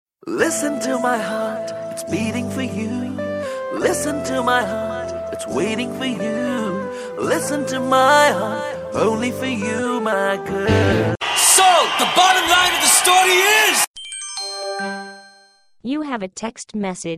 Message Dif Tone

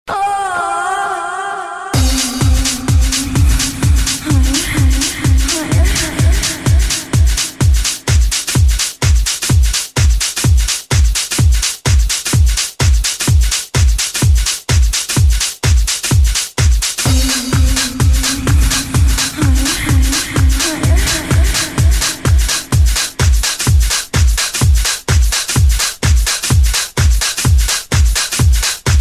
Congas

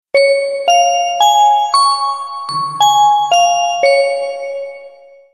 Tongdingdongding

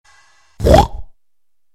Pig; Angry Birds

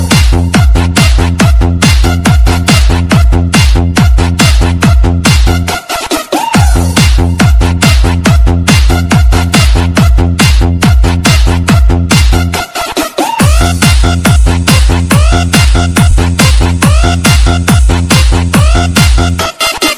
Punch Dance

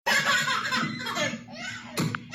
Baby Laugh

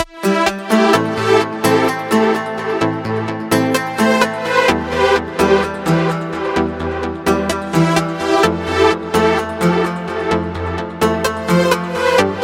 Euphoric Synth

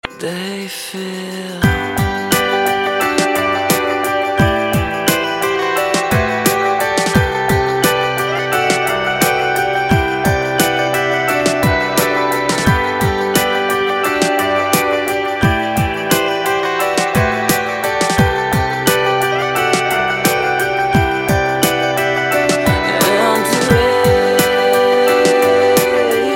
Heavenly Music

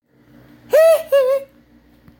Hee Hee

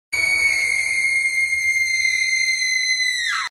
Scream

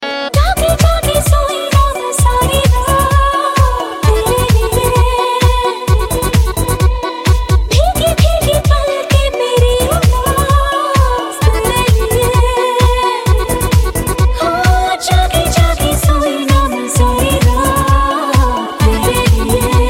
Female Mix

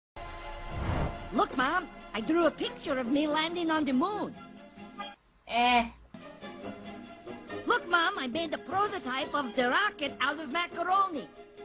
"Ehh"

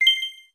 Bing; Switch

